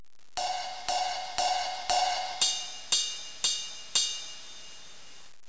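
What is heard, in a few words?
ride cymbal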